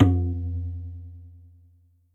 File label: Tabla, Percussion, Yamaha DTXpress